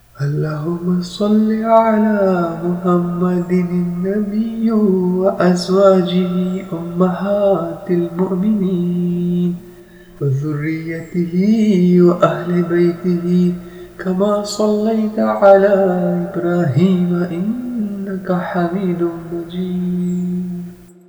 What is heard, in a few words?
durood